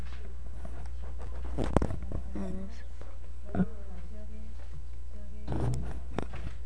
spoon

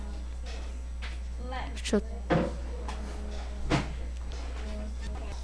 shot

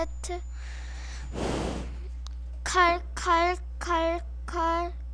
car

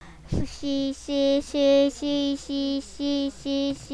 sea

